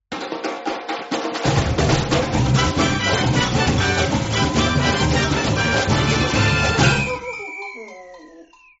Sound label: mus win game